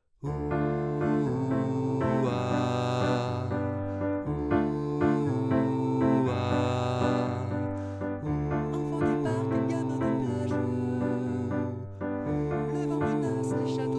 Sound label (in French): basse